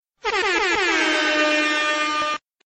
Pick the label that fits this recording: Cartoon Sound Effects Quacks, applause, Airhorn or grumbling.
Airhorn